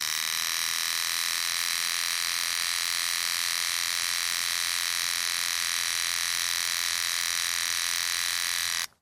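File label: hair clipper sounds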